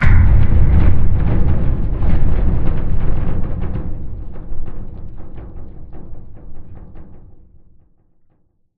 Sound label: dish crane move stop metal stress; Titanfall 2